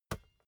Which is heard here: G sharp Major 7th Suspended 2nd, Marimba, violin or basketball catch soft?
basketball catch soft